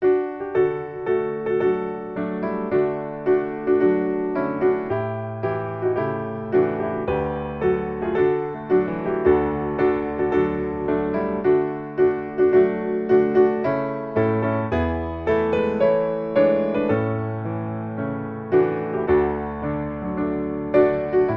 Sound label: piano